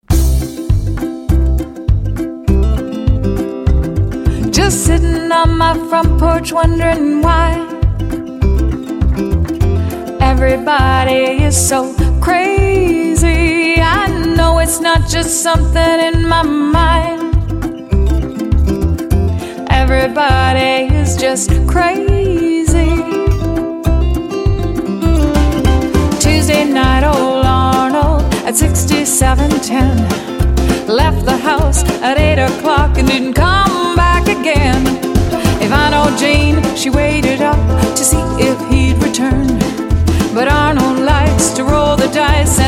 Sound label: Canadian Girl Front Porch Song